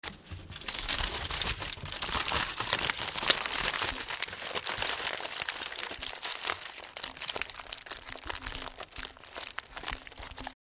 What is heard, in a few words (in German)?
verpackung alupapier